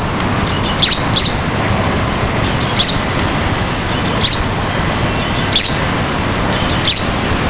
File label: Gtit, birdcall